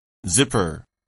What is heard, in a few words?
zipper